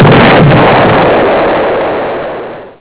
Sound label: ship explode; starwars